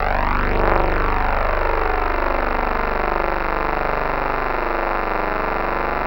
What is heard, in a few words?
Pulsydrone; Sonic Foundry (Sony Creative Software); Numina II